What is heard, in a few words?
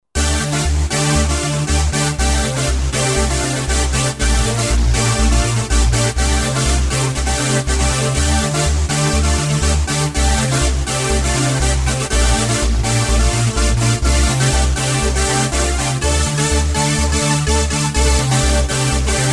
Dance Stack; Roland SR-JV80-09 Session